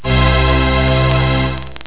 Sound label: chord